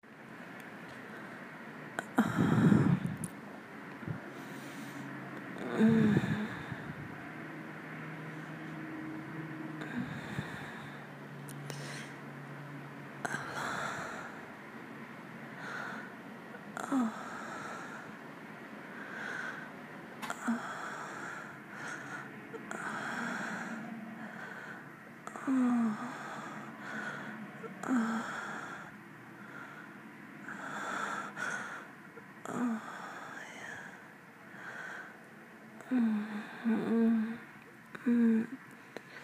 F Stress Relief, lady noises, dirty talk, wet noises, some giggles; some edging